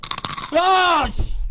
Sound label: crunch scare